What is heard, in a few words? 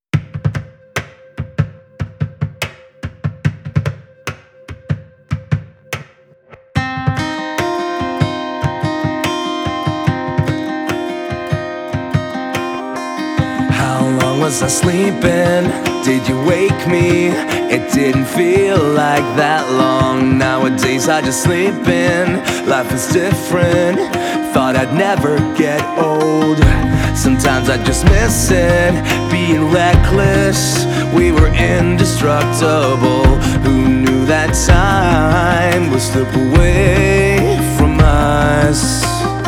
acoustic version